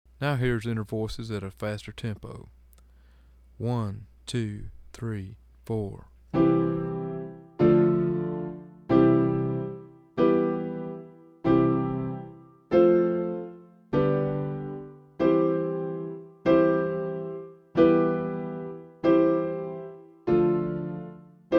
Inner Voices Fast

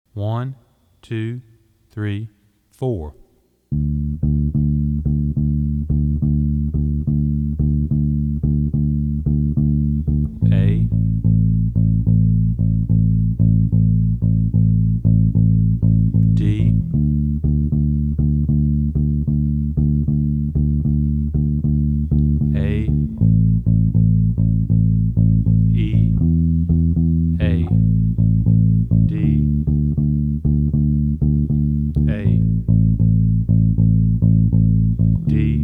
Rock Shuffle In A Slow

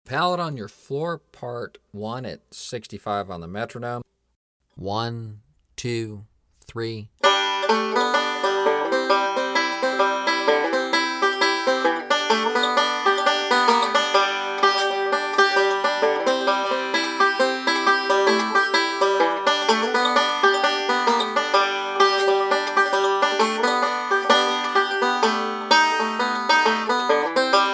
Pallet On Your Floor Break 1, 65 Bpm

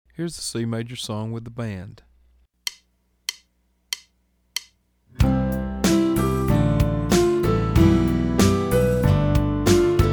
C Major Song Fast

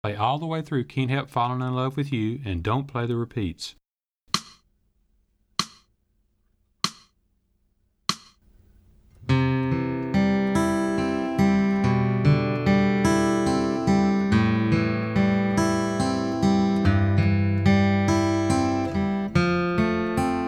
Slow

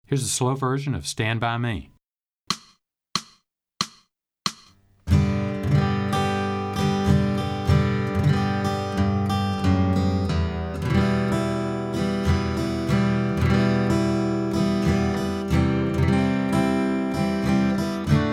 Slow

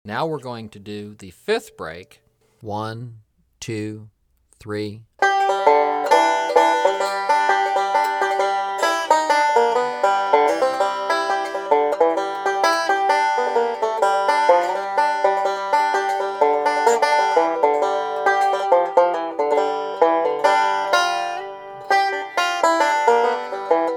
Hammer Break 2 @ 64 Bpm

